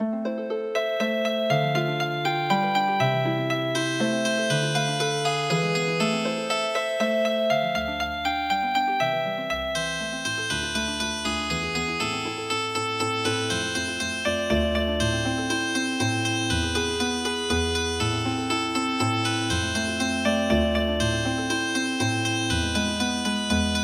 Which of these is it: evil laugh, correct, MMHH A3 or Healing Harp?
Healing Harp